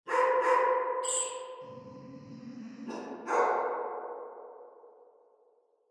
stairway

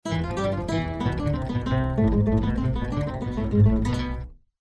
sub

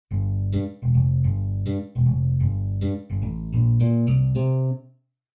short plate

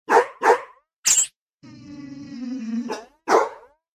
transient

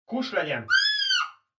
car

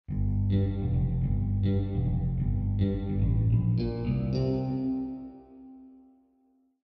concert

